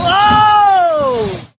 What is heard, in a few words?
wooohhhh